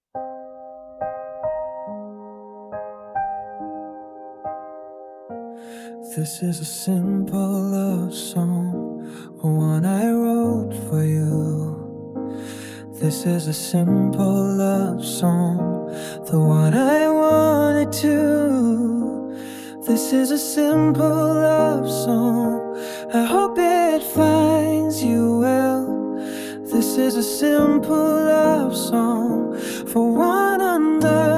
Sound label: simple love song